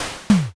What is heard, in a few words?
garbage